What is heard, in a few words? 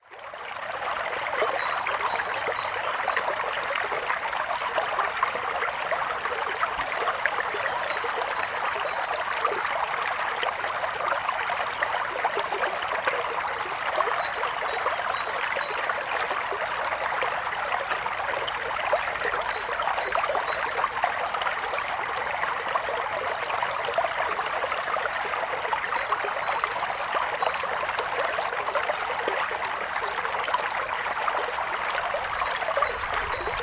ambience